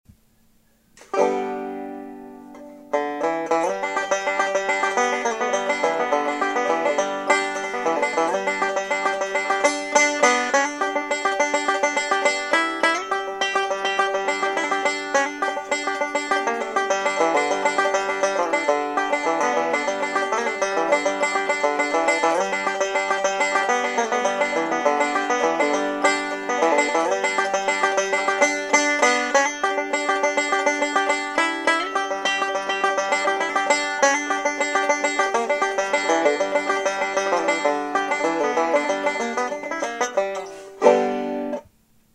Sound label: nady mic